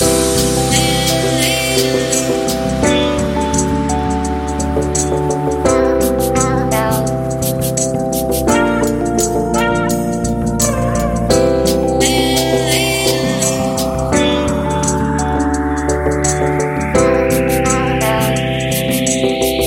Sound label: Hip Hop Fashion